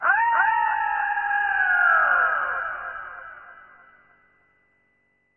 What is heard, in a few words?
Eagle Screech Echo